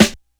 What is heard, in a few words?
snare g sharp key; snare drum